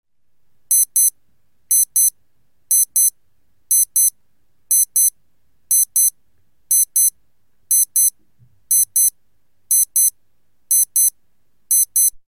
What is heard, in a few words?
beep